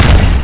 explo short; ogrotron

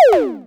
Toy Space; Mark E B; matrixminer

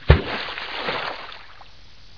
pool splash; waterworld

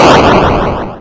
explo atari; cavernsoffire

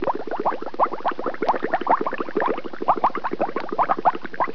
water bubbling; waterworld

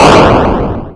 explo atari; cavernsoffire

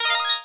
system start; ogrotron

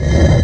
concrete blocks; matrixminer

